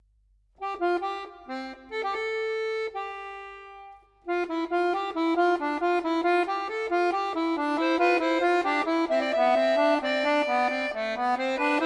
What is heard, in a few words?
Fugue in C major